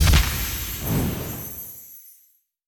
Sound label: boost add fs slam; netent